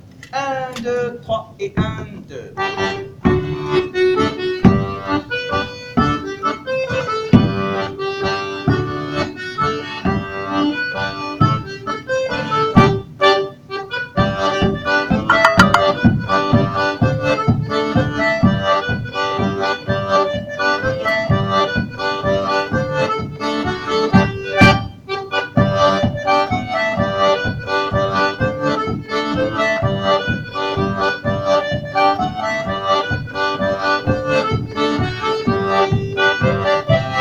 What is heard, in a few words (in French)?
Mazurka